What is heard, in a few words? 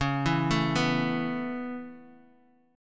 Db Minor 7th Sharp 5th, Bass Standard; arpeggiated; JGuitar